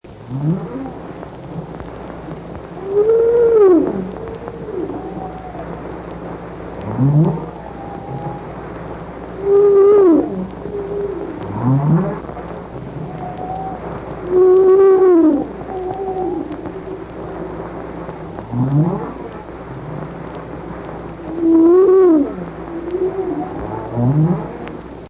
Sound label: humpback whale